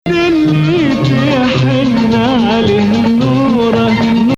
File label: Nahawand